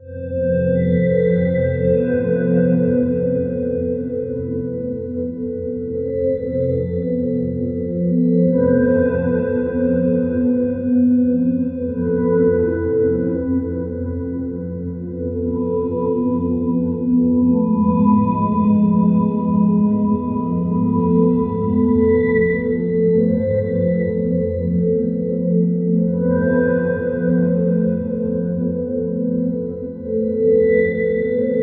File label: a brooding dark ambient